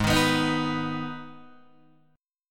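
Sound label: Ab Minor chord, Guitar, Open D tuning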